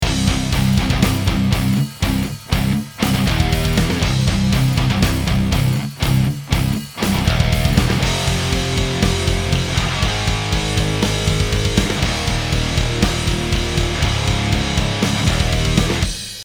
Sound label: amp; peters mako mesa krank splawn